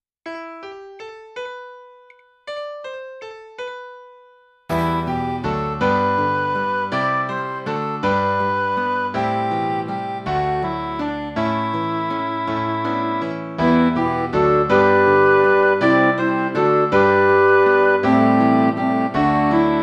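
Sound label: gospel acclamation ordinary time chant midi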